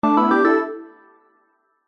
multimedia alert simple basic warm soft synth positive